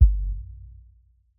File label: Kick Sub Stereo